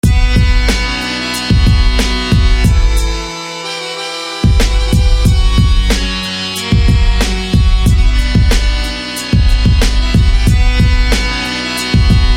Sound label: Accordian; Carlino's Accordian